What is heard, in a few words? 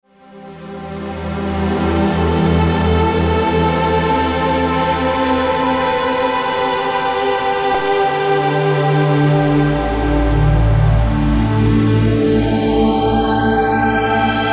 filter yamaha an1x pad; mam warp 9